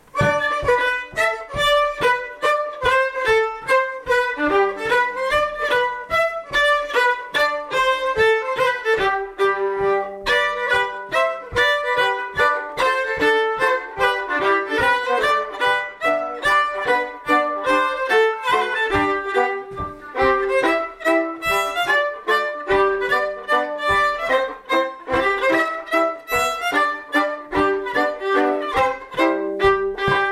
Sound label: mazurka; kristian kuhlmann